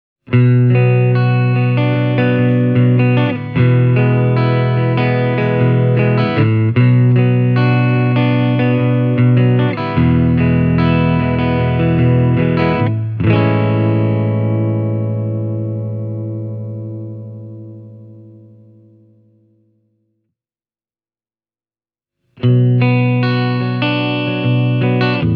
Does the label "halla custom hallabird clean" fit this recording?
Yes